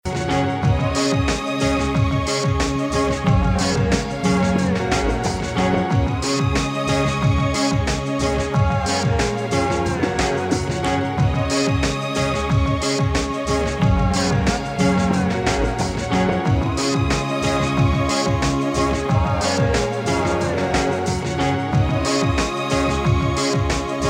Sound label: Melody, RingTune